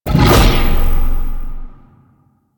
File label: Shroud End Burst; Warframe; Voruna